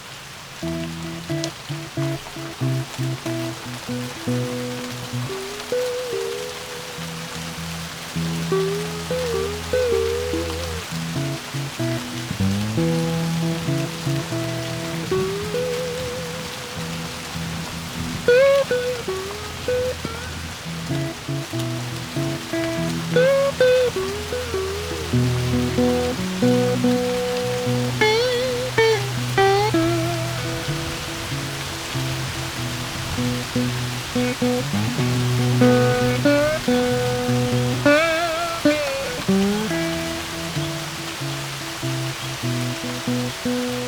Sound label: rain light; Barnyard; PlayStation 2